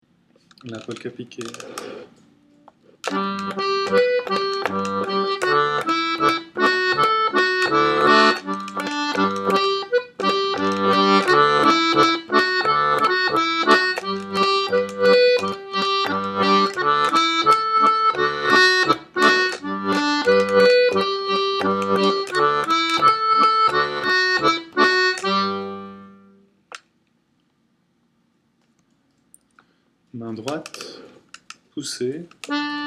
Polka piquée, accordeon

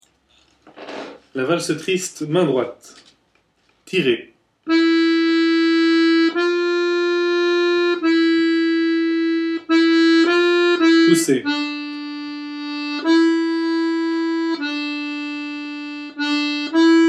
Valse triste MD, accordeon

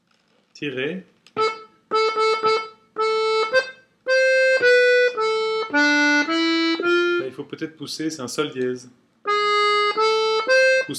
Maraîchine bulgare soufflet basses, accordeon

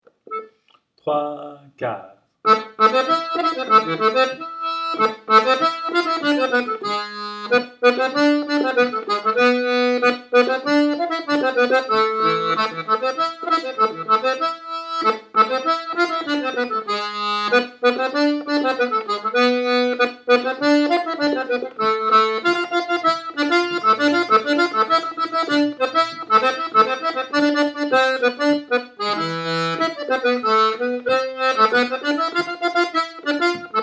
Esperanza MD rapide, accordeon

